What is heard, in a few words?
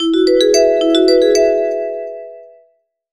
Ringtone Incoming Call